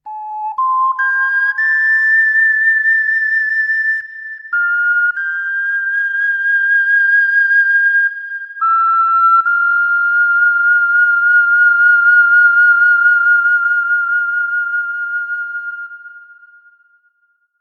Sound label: ocarina; spica; anemoi